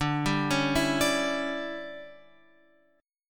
D Major 7th Suspended 2nd Suspended 4th D, Guitar, Open F; DM7sus2sus4 arpeggiated